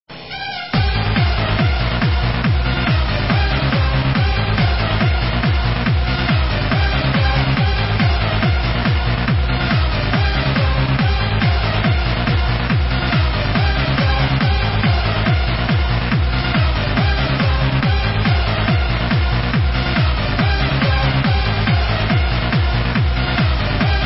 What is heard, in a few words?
Hardstyle Disco